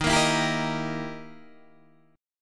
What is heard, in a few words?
E Minor Major 7th Flat 5th, D sharp